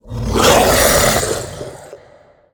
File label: Cyclopian Horror Play